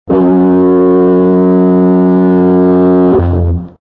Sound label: Clean 4 sec blast